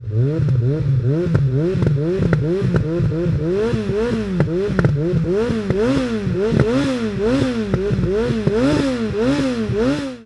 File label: yamaha xjr1300 gp1 rac; SEBRING